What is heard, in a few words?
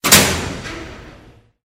door close